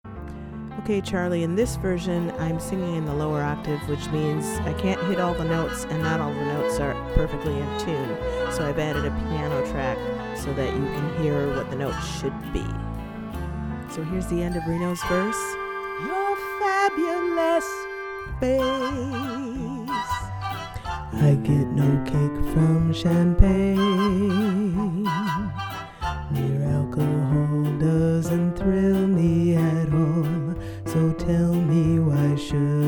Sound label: piano